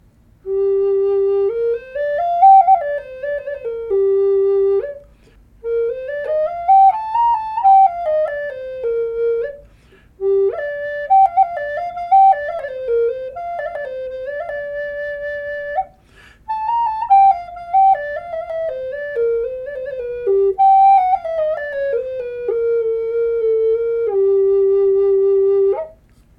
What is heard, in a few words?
G4, flutes